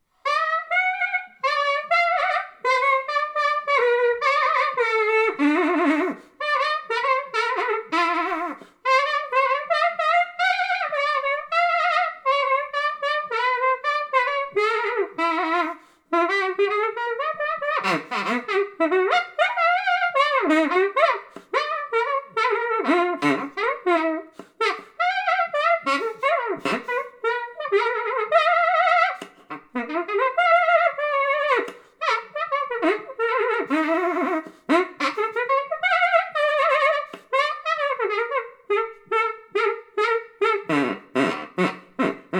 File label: SAX TOOTER